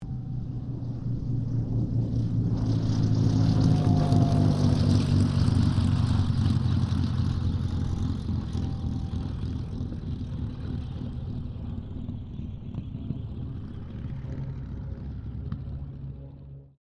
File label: AIRCRAFT CA-18 MUSTANG LAND; P51 /CAC-18 Mustang; Airplanes; Vehicles